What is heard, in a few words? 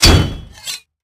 Sword hit